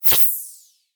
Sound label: garbage out medium; tetrio